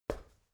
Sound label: step ladder metal single